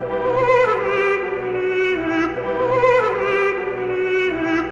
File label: Opera